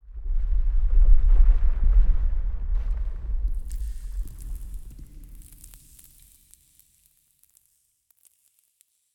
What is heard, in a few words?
rock fall; Rust